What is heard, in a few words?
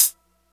trebly high hat sound e key